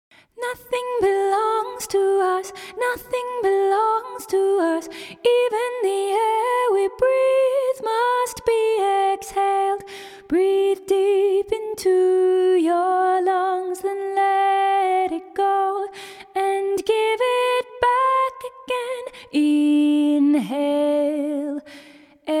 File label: inhale exhale no piano